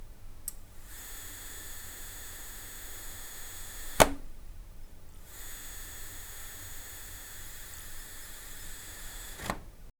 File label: carpet wall, realism; enhance